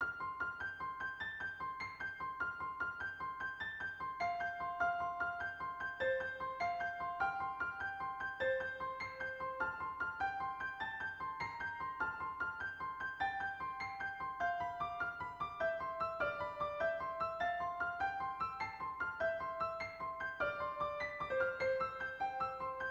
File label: adeste fideles piano in F